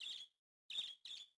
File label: birds; World of Tanks Blitz; hangar blitz